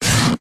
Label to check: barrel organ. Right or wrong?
Wrong